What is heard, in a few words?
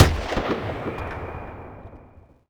imperial sniper far